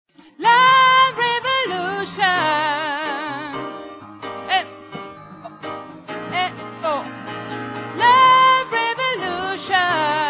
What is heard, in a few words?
tenor